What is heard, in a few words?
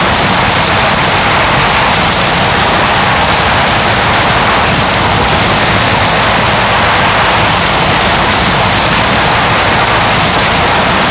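tire concrete interior; engines; War Thunder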